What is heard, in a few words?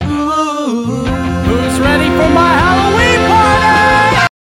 Halloween Ooh